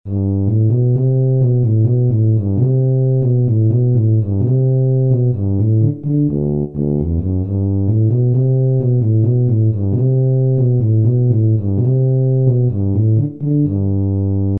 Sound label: walking